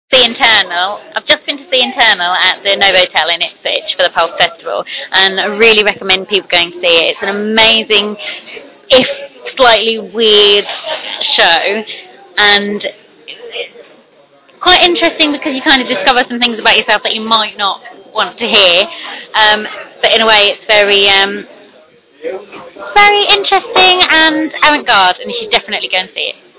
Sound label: audience reaction